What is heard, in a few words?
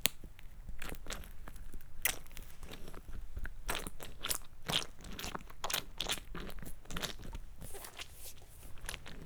Uncomfortable Gooshy Sounds; Micro Pack